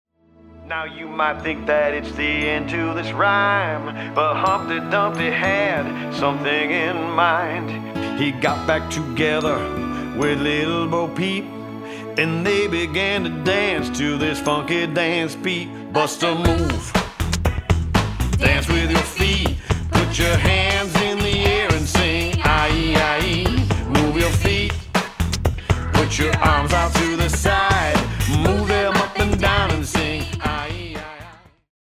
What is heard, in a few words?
nursery rhyme dance; kids songs